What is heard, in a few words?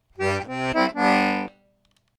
Just phrase two; Back Door